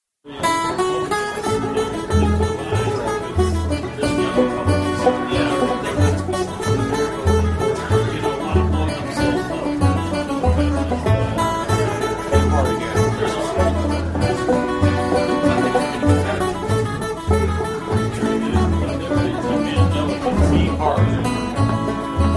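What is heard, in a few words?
2 guitar; Devils Dream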